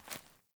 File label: Grunt Boot Walk Veg; Characters; Enemy; Melee Grunt; Footsteps; Boots; Sinecure